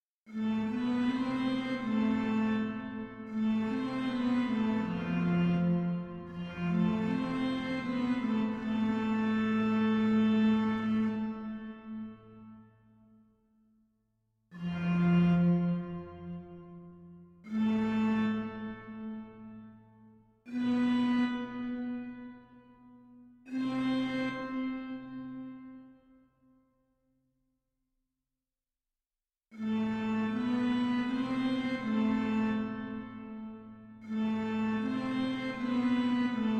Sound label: Door Lock Organ; Club Penguin original soundtrack 2005-2017